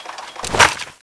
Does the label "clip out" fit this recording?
Yes